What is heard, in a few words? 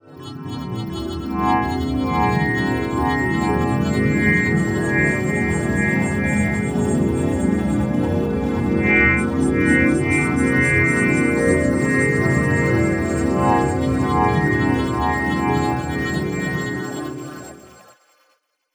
C wholetone wanderer, spacey; Zero-G Ambiosis